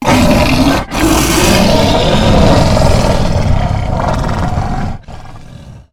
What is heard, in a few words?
Round End Victory; Evolve; Goliath